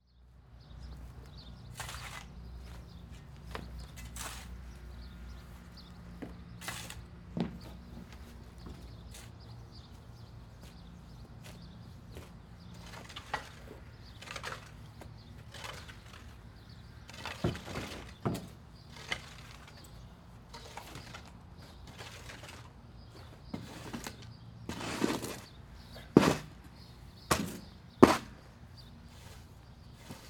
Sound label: Industry Ambience Home Construction Backyard Excavation